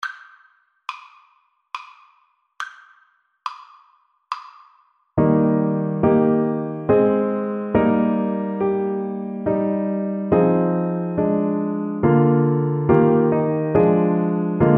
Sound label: sonata, Cello; marcello; sonata4 op2 no4 mvt3